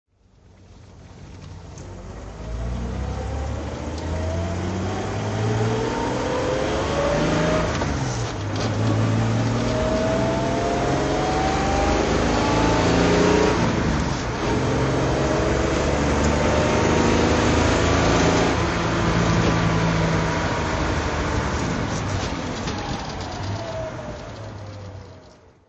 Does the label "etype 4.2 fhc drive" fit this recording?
Yes